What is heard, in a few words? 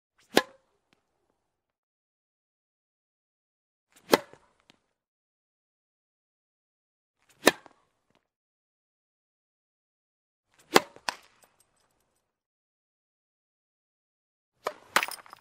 tennis